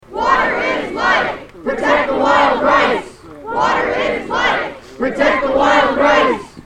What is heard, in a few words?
protest wild rice chant